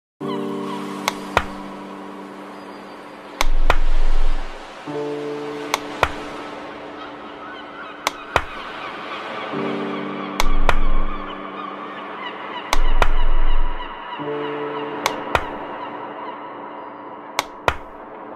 techno live sets, pool party mix; pre compression

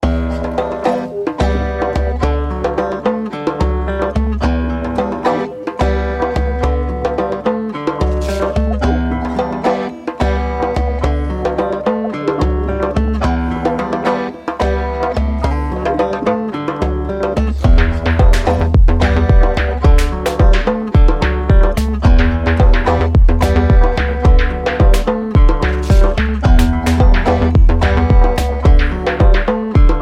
guitar mix instrumental

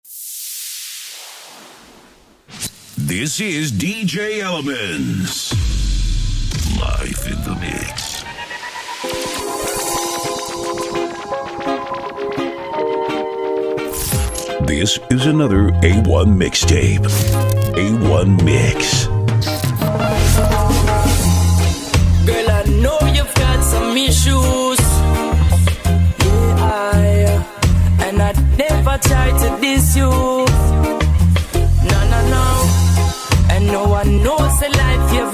reggae music mix; reggae throwback

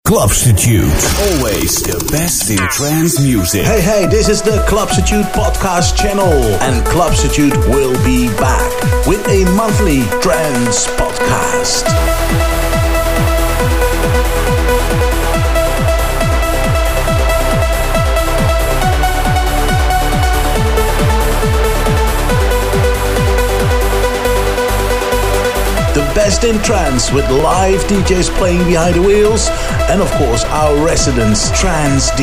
dance radio promo trance

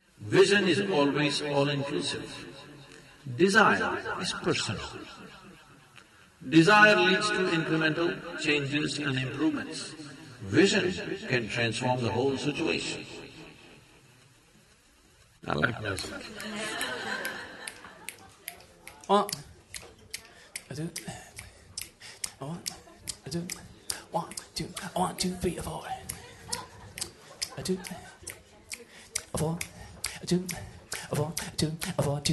boomjazz w scratch melody

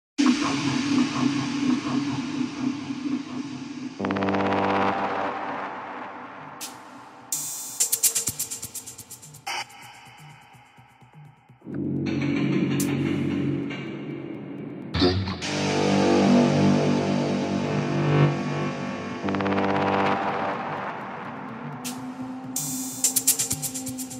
techno live sets, nightmare techno mix; soi cowboy

